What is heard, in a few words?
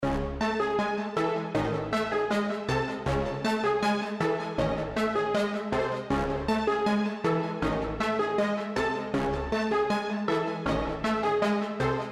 C min 158 BPM Brass